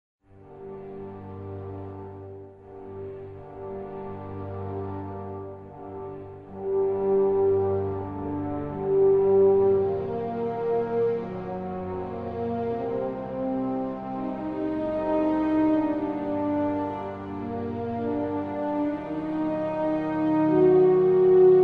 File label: Lose Battle; Fortress; heroes of might and magic online 2010 pc